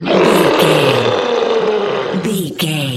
troll monster taunt

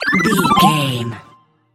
collect item sparkle pop

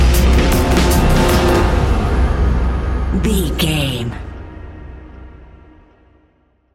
whispering stinger

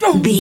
voice male hurt pain

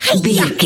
voice female attack

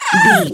goblin fairy hurt pain

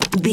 bow crossbow place arrow load

